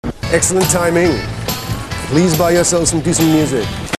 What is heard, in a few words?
music